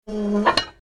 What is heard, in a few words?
Tank hatch opening